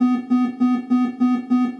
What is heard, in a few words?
warning Beep; Barotrauma; Subsurface